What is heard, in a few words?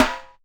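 snare drum Acoustic Side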